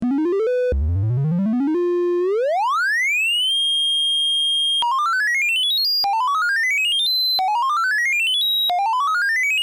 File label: vco triangle with seq; analog synth